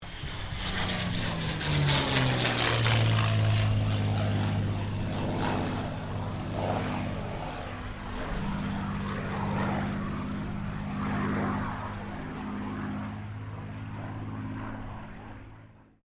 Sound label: AIRCRAFT CA-18 MUSTANG PASS; P51 /CAC-18 Mustang; Airplanes; Sound Effects:Vehicles